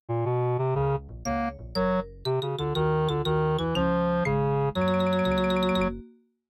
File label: MIDI Animation stings; Carmen Sandiego Junior Detective Edition